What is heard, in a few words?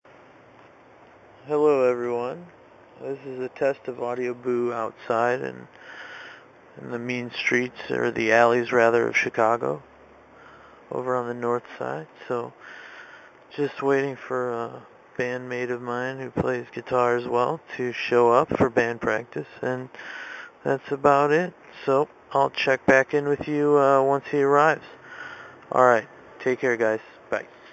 rainy alley blues